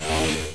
sab swing

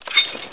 rpg fin

